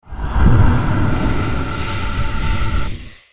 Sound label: Stinger Action Medium; Naughty Bear: Panic in Paradise; ps3; xbox 360